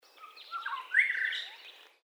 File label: bird lithgow call stereo